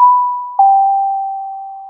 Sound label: Ships Dinner Bell; fldigi; alert clips